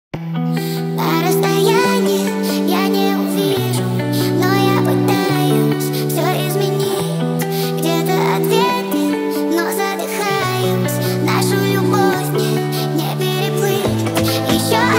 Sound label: hardstyle remix